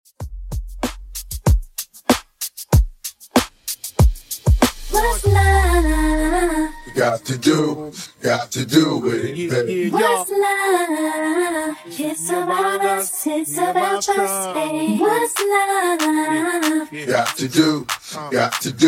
95bpm; Epic Intro

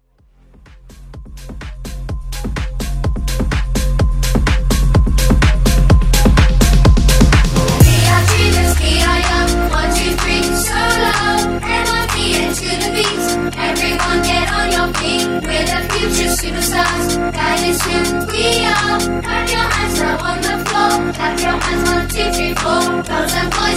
Techno

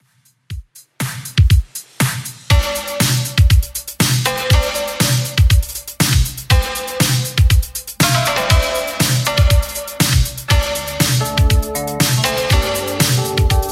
80s Redrum 120bpm

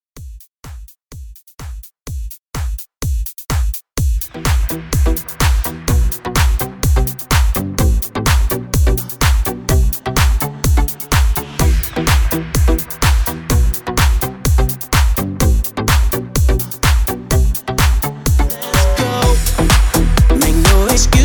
HOUSE MIX 126 BPM

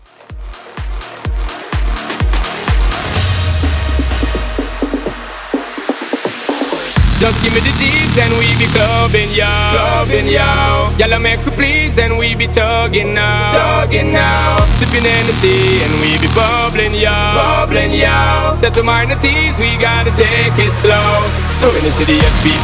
Mashup 126

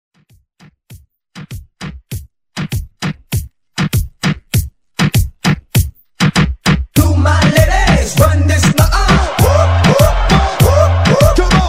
Reggaeton Hype

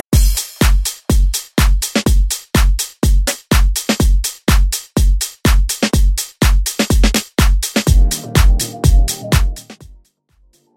House RMX Extended 124 Bpm

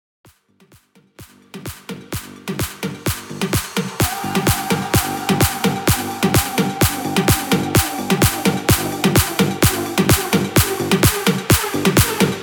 128 BPM

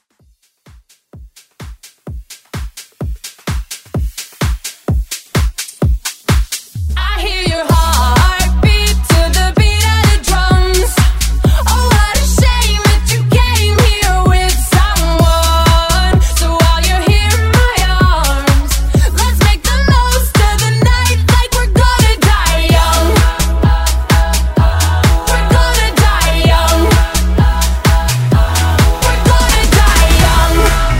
MashUp

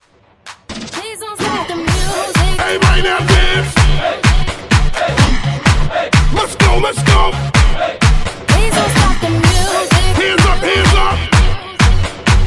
Party Break Mash Up 127 Bpm

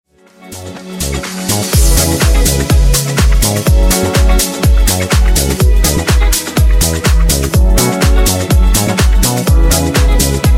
Disko Funk Remix 124Bpm

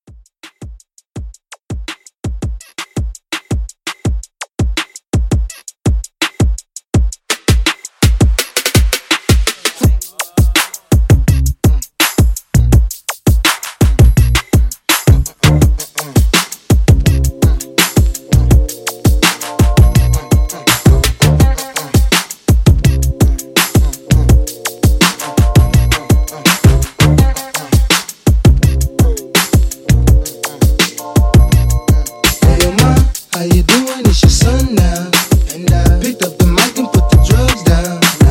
Throwback Hiphop Re Drum, 83

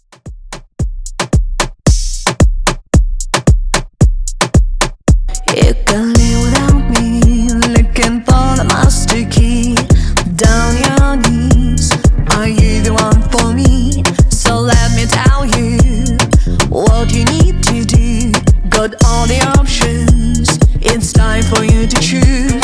Re drum

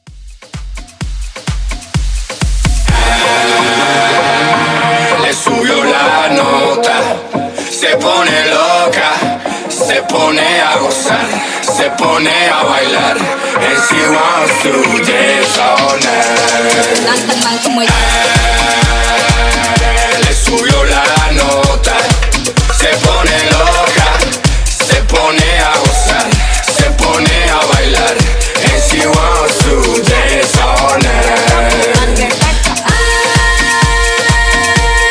128 BPM; ReDrum

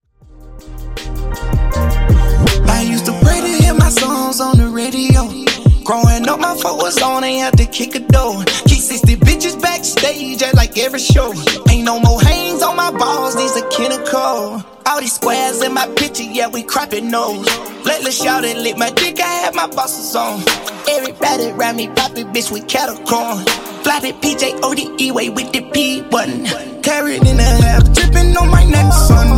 80Bpm; Remix Dj Intro Outro

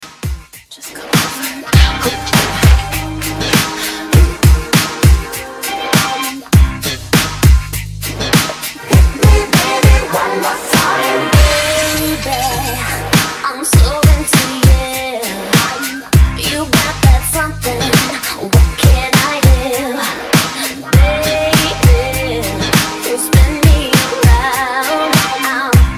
Redrum 100Bpm

